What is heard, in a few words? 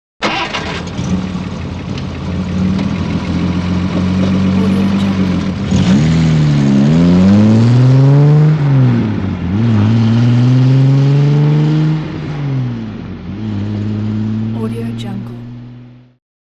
mercedes starts up and drives away; hollywoodedge